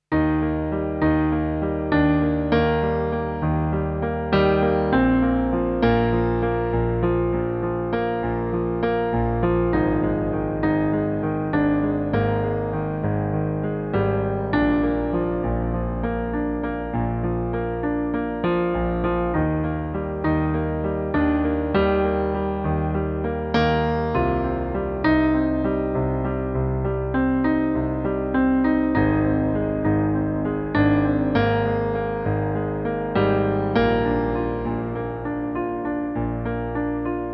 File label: sweet piano